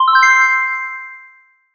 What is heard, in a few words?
sparkle wow